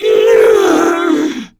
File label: Death, Female